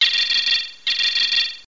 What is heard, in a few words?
level out; boulderdäsh; future